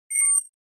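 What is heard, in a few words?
Flash Beep Electronic Beep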